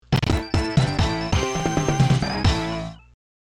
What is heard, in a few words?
bgm